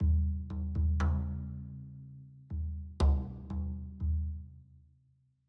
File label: Citadel Music Drums; Frozen Flame; windows